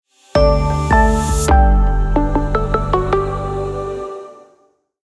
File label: Sound Logo